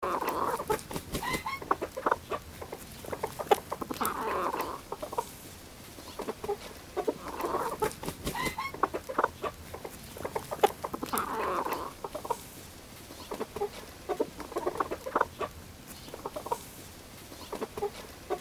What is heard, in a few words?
Animals farm chickens clucking hens and roosters